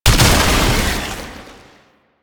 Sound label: Tank Infested Weakpoint Destroyed; Enemies; Warframe; Lasrian